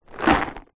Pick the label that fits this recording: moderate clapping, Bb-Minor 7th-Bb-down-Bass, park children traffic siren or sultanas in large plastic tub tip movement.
sultanas in large plastic tub tip movement